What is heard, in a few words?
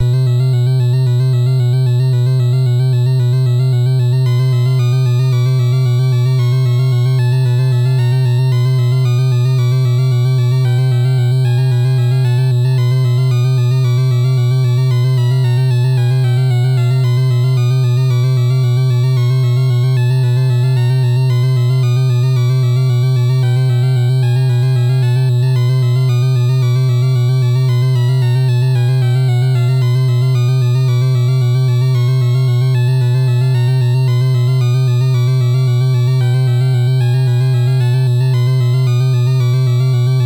Rain Forest; Zone 4